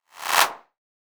CLAP FX

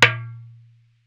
darbuka note

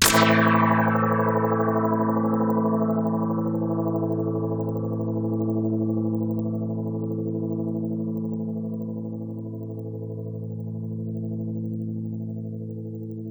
GUITAR FEEDBACK

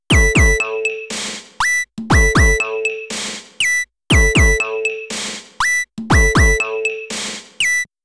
Original drum lp next

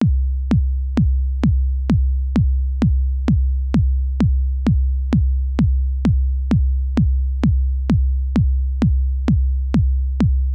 basic kick loop 130 BPM

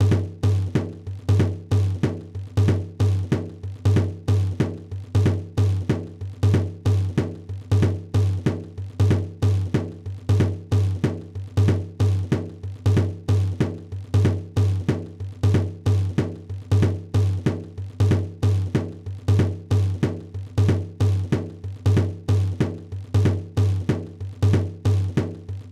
BENDIR

